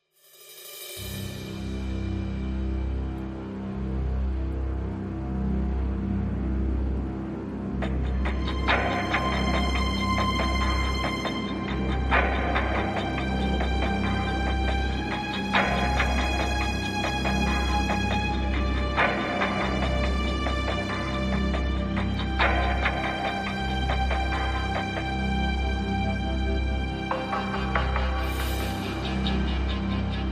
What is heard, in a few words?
No Bass